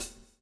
swing

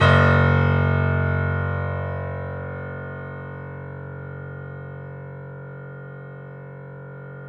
3D Boesendorfer, Pianos, F#; E-MU Producer Series Vol. 5; 3-D Audio Collection

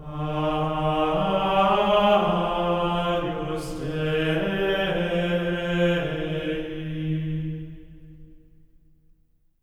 AGNUS D#4, Symphony of Voices; Spectrasonics; GR AGNUS

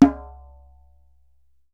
DJEMBE, African Perc

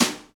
New Brush Snare; Snares

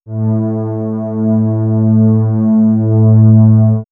Swirls Ambient Drone, pads; X-Static Goldmine

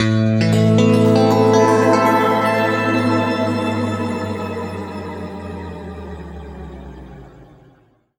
A, guitar chords; East West; Steve Stevens Guitar Samples Collection

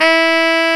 SAX TENOR, Roland Super Sax; Roland LCDP07; Tenor V-sw; Tenor 3way

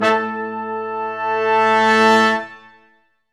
BRS QUIN SW, Quintet sfz; Roland L-CDX-03

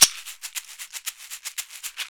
Caxixi Beats; Roland LCDP14 Africa VOL-2

